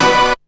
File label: HIT, STAB; SoundFactory; SMPMANIA